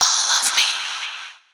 vox

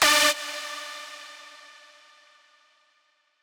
lead

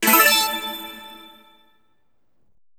SYS COIN; maimai

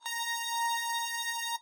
lead

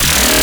bass

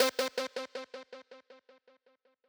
lead

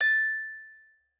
musicbox

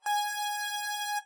lead

